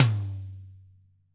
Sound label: TOM TOM, ACOUSTIC KIT; Greytsounds VOL-1